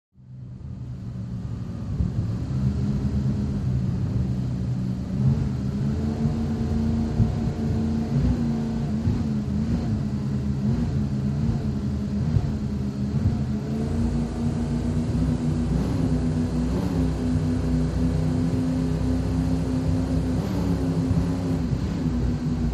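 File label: Power Boat Interior Cruise, off shore power boat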